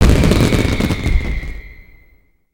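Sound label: cannon explode; super cannon; destructibles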